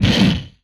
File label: Explosion; Space Blasters2D